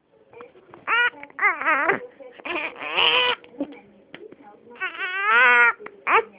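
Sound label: baby hungry, female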